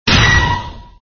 SFX sound spider hit

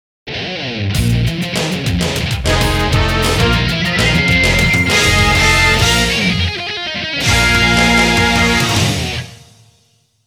Miss; stunt typhoon; landmaker

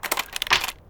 Lock Pick Big Clic

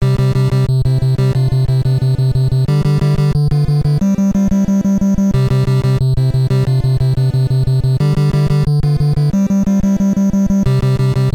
Opponent Knocked Out!; Kenji Yamamoto; Punch-Out!!; animal crossing full gamerip

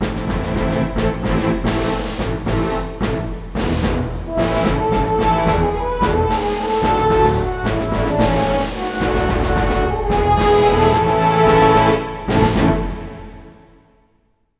Duel Music Win; sid meier s pirates 2004 pc

